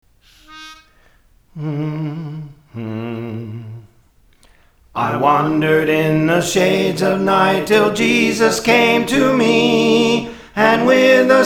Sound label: Soprano; Praise For The Lord Training